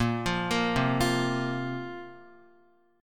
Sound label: Bb Suspended 2nd Bb Guitar; arpeggiated